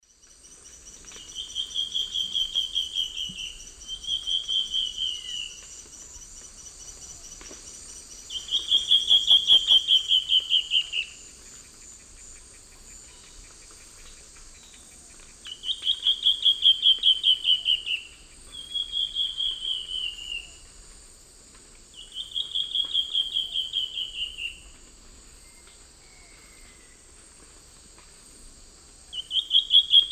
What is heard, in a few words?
Myrmoborus myotherinus, duet; S. America; Eastern Lowlands